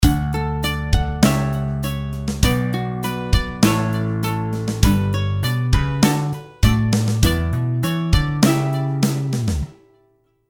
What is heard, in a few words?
delay